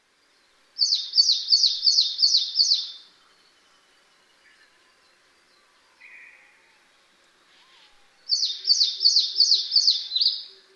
sendaimushikui; センダイムシクイ; さえずり; 2005年5月21日 静岡県静岡市

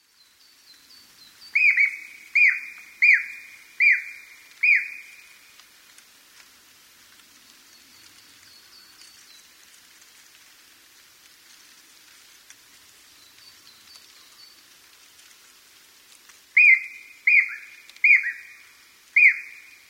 aogera; アオゲラ; さえずり; 2015年3月295日 静岡県富士宮市

